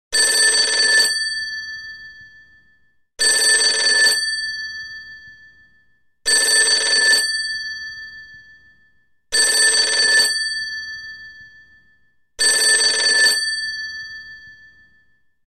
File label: Telephone Rings Bell-Type Desk Phone; Telephones